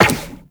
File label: Run Zipline; Warframe; Tenno